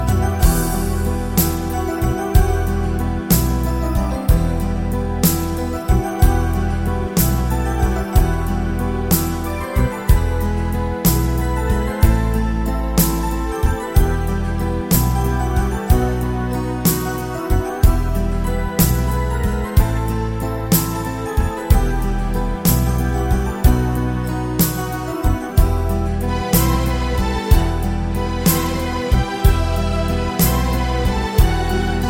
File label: Saxophone